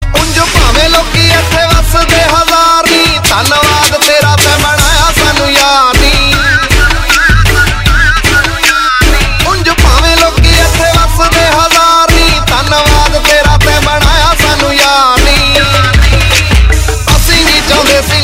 punjabi ringtone

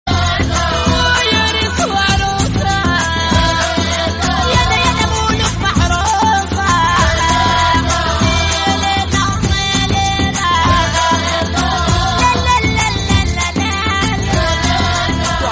rai

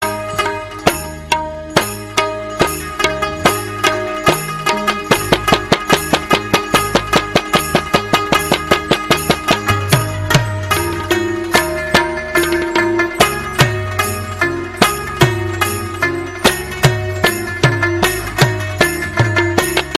pashto tabla rabab remix; ringtone

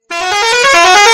bus horn notification; ringtone

